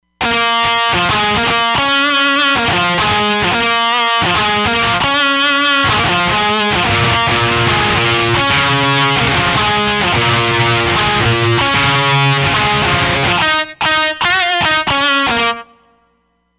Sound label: Roland Fantom XR